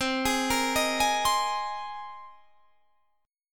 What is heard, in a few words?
C Minor 7th Sharp 5th C; Cm7#5 arpeggiated